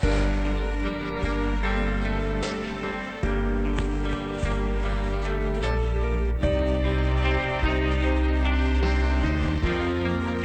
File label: Sad and Romantic